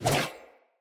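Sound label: Sword Whoosh left to right; Weapons; Player; Swords; Fey Sword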